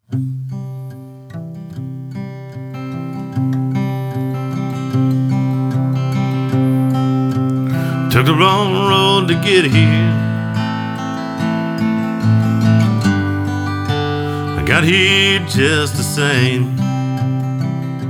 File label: ACOUSTIC LIVE